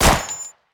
FMG9

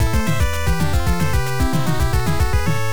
Sector Clear; Kick Master; NES

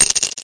coin; misao

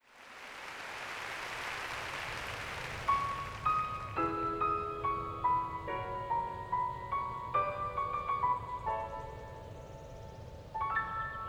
Baby Bird Vocalized Version; Bravely Default; Luxendarc Travelogue

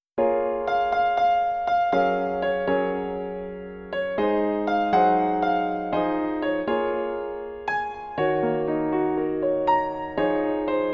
Piano Arrange Version; solfege vocal collection

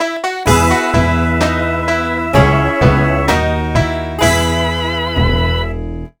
Quest Complete Sting; nds

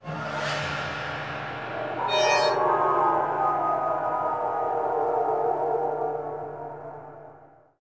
Hum 2 Flourish; bolt

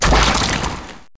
die egg

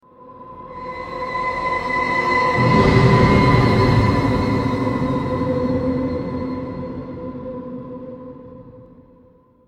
Stinger Swell Long

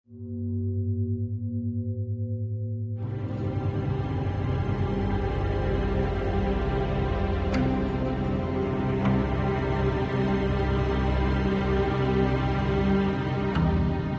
Loop Neutral; tales from the borderlands gamerip 2015

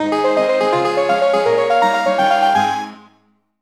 Jingle Clear Silver; let's draw; nds

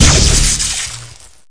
fire shock Heavy

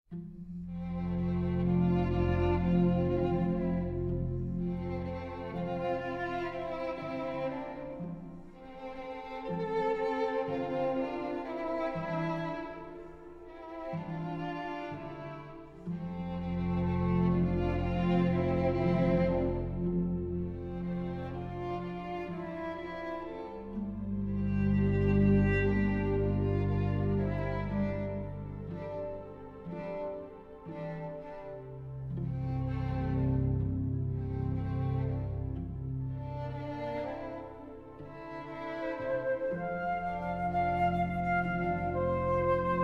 No Vocals; Assassin's Creed Syndicate

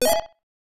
Coin; Hisshou 777 Fighter; SNES; Continontal

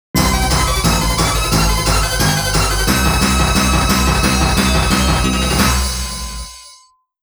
Victory Fanfare; VS Mode End; Arcade Disc in Jaleco Variety

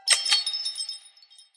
Click

